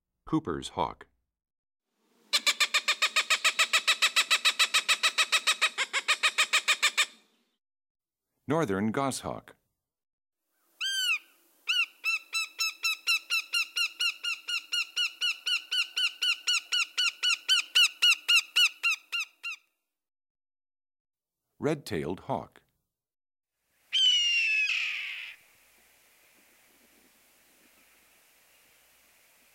Cooper's Hawk, Northern Goshawk, Red-tailed Hawk, Red-shouldered Hawk, Broad-winged Hawk; Birds; Eastern-Central; Bald Eagle